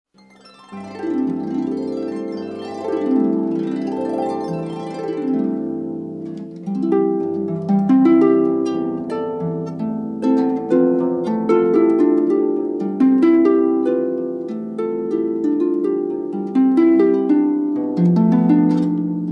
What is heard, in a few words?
Harpist